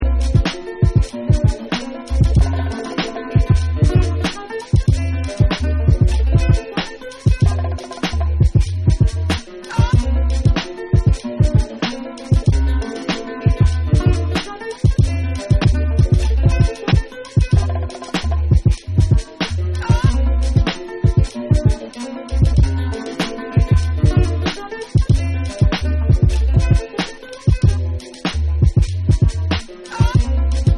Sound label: Instrumental Remix